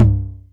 Tom Lo, Novation Drumstation 909

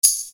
Percussion, EuroDance

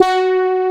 SYNTHESIZER, ANA HORN; AKAI S6000 CD-ROM - Volume 1

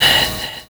Fx Vox; Equipped Music; Loungin House

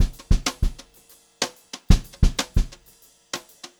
Brushed Drum Loops jazzy 126; USB Soundscan vol.39; AKAI; 90 s Sample CDs

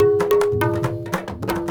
INDIA L; Spectrasonics - Heart of Asia CD2

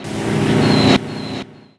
DANCE FX

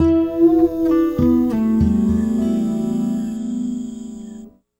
Guitar And Synth

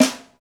St Snare, Roland BK-5; Snares

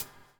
BUBBLE; Spectrasonics - Backbeat

